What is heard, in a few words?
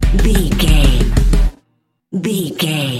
smile camera sting, hip hop, rap, rapper, soul, funk, neo soul, acid jazz, motown, r&b, pop, urban, urban contemporary, confident